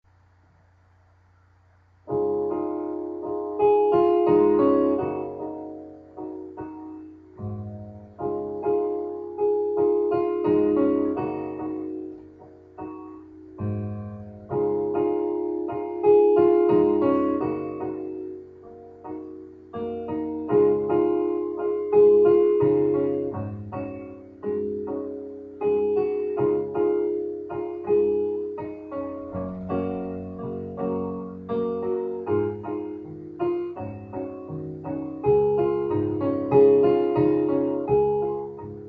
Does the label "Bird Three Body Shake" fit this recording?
No